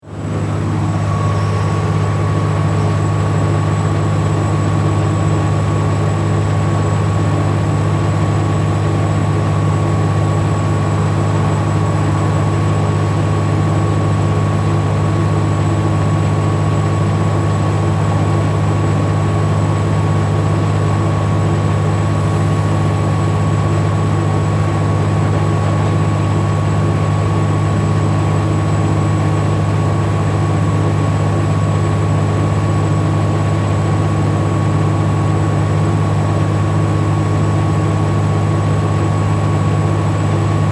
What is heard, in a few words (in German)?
Baustelle Atmo